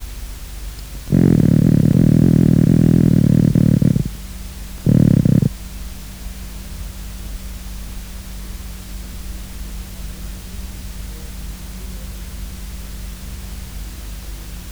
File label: Pomatoschistus pictus Drum, fish